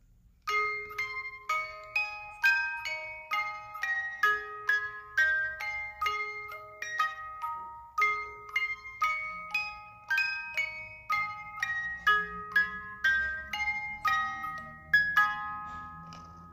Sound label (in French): 18lames hymne a la joie, boite a musique; Boîte à musique en bois personnalisable